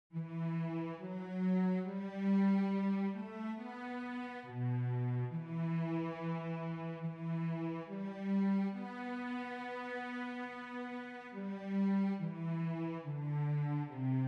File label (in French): VIOLONCELLE